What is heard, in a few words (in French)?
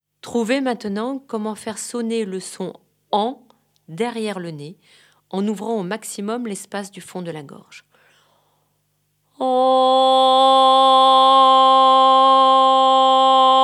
technique chant diphonique